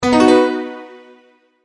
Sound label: multimedia tone advance